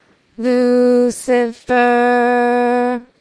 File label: singing